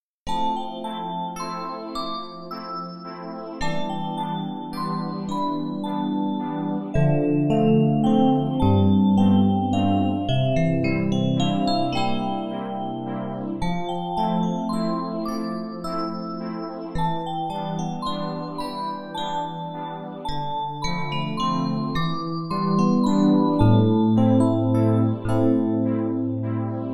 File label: song